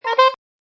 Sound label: A saxophone accent.; Horns; Musical